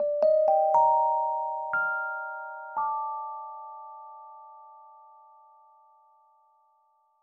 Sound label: Mellow Rhodes C